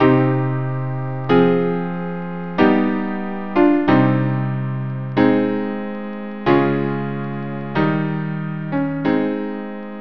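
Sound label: C minor prelude violin viola; chopin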